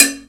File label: Claves